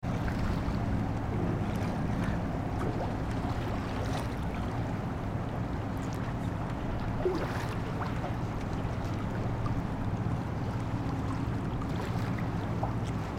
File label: harbor